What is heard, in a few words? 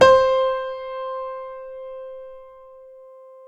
PNO FF; Synclavier Sampler Library